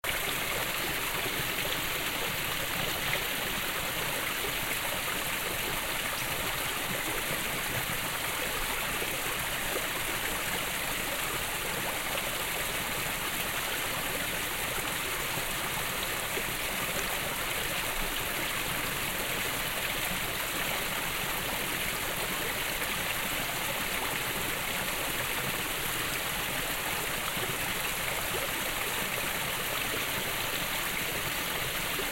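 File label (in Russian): небольшой водопад на реке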